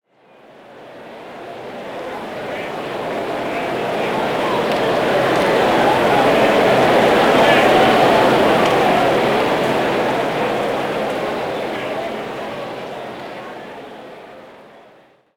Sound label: Crowd Ambience; Pitch Black Audio Pong